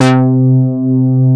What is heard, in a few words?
MOOG BASS C4; Best Service Dream Experience